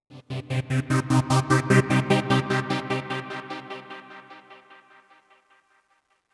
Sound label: Oneshot Synth